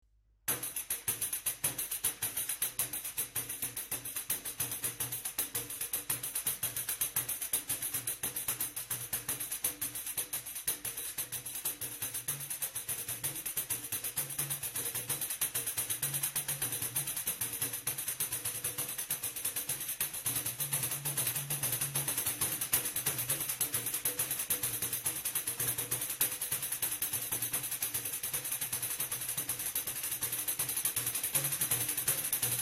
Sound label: pandeiro